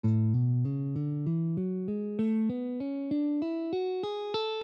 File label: A Major Ionian, guitar